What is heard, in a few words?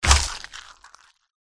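werewolf attack contact middle; oboroten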